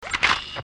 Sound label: Wings Opening; Buzz Lightyears Wings Flicking Open